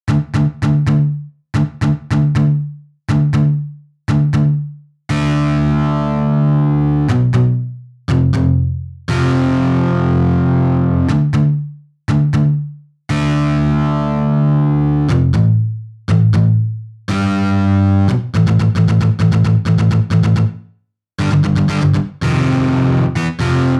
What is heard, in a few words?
Strum GS